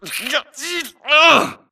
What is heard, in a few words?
grunt Struggle; Titanfall 2